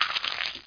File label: FROG CROC MUNCH; Frogger 1997